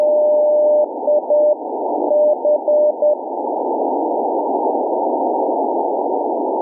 fishery buoy cw-u

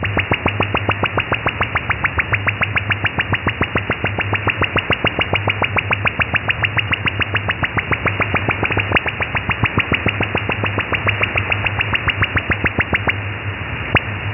othr jorn

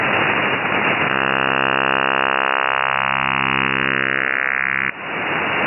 radar foghorn 66.66 sps

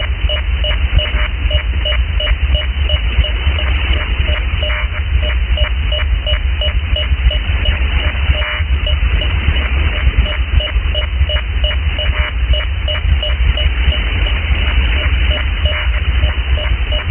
link-11 clew dsb

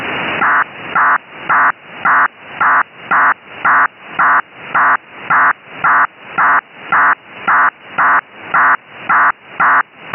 dprk psk 1200 arq

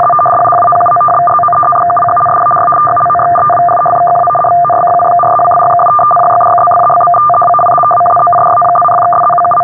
f1b 500 hz 100 bd, fsk

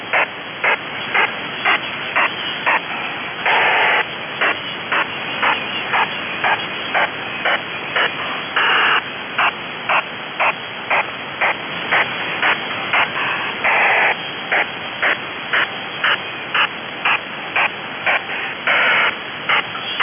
link-11 slew

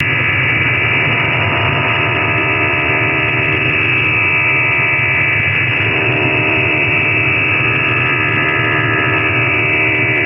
prc 4 4 idle, psk

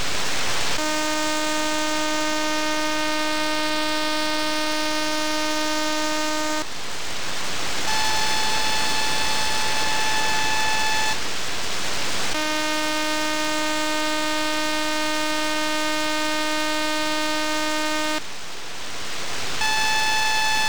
OTHR; othr amop irn 306 870 sps

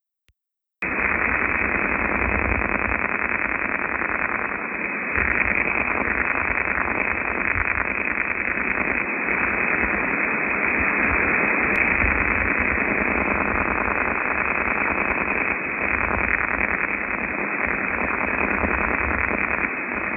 SuperDARN

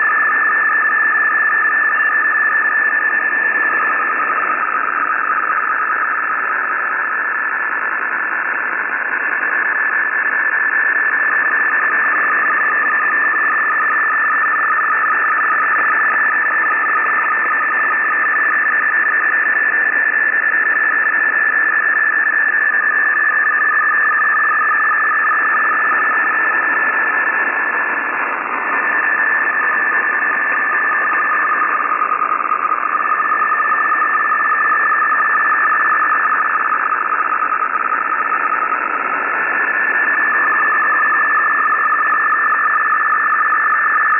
f1b 500 hz pol mil, fsk

